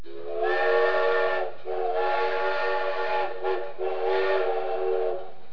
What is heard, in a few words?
Steam Whistle